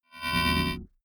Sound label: hologram appear, energie